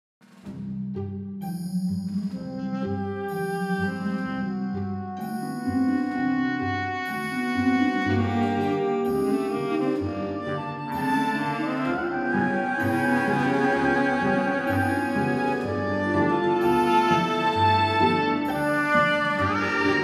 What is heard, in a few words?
Saxophones